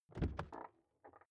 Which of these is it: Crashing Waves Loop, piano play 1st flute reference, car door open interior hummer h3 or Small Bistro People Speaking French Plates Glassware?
car door open interior hummer h3